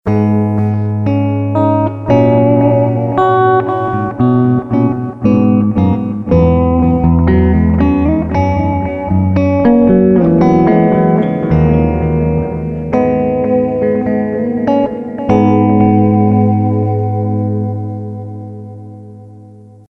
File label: delay